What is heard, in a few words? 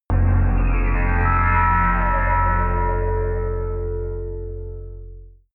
whistle synth, drones